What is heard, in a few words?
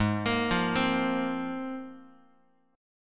Ab 6th Add 9th down Guitar; arpeggiated; x,4,1,3,4,x; JGuitar